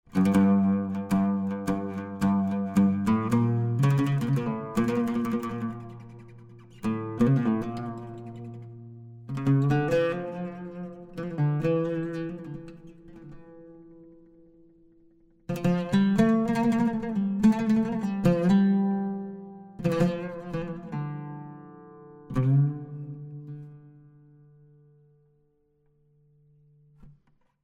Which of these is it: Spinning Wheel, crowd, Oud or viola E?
Oud